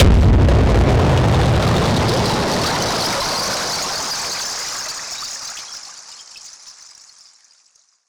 Noise Bomb; musicradar; bomb factory

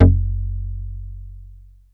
Bass F#1, Prophet; ShortBass2; Oldies

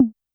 Tom Analog Soft, YAMAHA RM1X

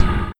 Noiz; Sonic Foundry (Sony Creative Software); Esoterik Beatz; Warsaw FX

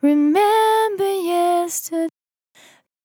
On A Star 65bpm F, Vocal; Zero-G

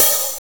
DANCE OHH, LOFI HATS

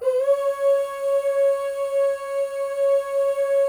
FEM, Symphony of Voices; Spectrasonics

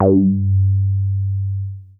MOOG BASS G2; Best Service Dream Experience

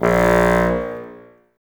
BNS A#1, Bassoons, Wood Winds; Miroslav Vitous - Woodwind Brass Ensemble; Bassoons Links

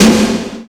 snare+hat; DRUMS

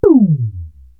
TOM Lo Disco, Korg TR-Rack

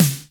D70 SD, D70 DRUMS; Crypton - DrumBase5000

